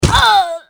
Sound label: die; Soldier Of Fortune II Double Helix; XBOX